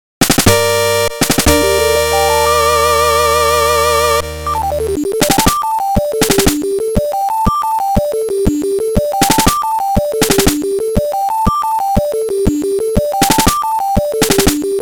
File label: Victory (Chiptune version); The Dark Spire Original Soundtrack 2023